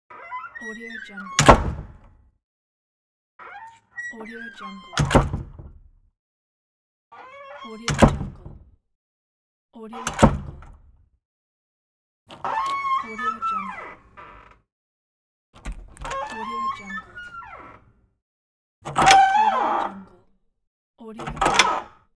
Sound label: door squeak